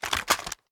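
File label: assault rifle reload end; viper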